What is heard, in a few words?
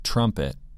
trumpet